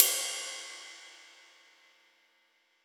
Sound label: Jazz Ride, Cymbals; Roland BK-5